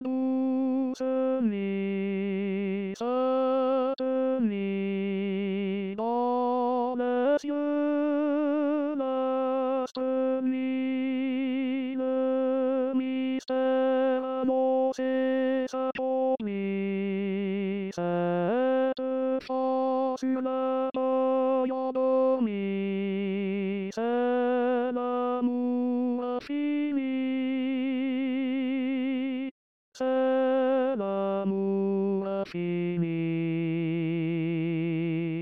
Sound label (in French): tenor